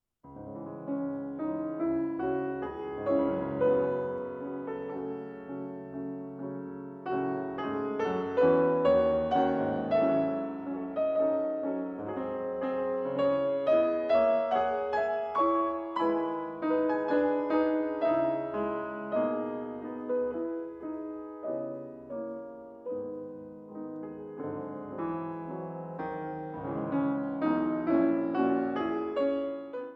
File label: Etude C sharp minor